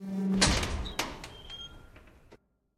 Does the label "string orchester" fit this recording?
No